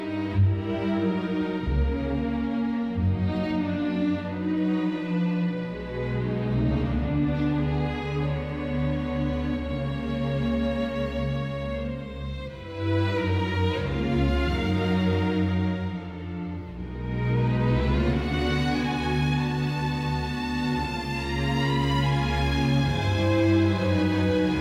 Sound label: clarinet concerto